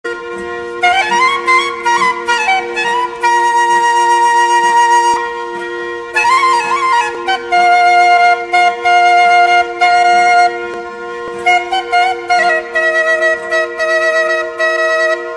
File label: sindhi bean flute